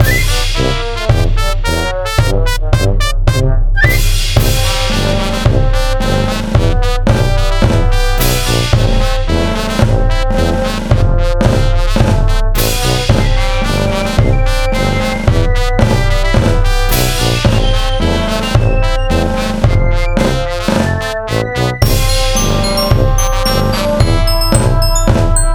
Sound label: marsch; theme remix